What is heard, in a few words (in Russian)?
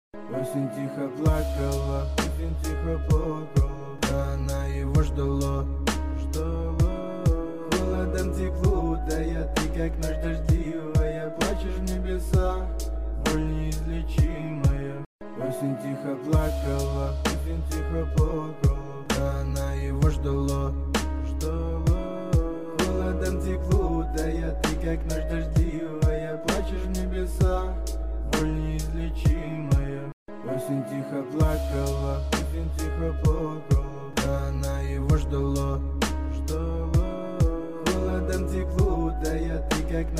bez slov